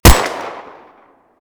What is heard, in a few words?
m1911 fire; STALZONE